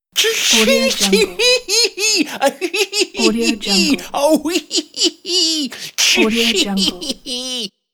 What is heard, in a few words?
goofy laugh